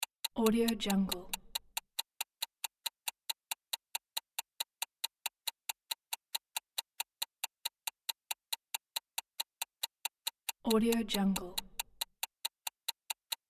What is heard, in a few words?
clock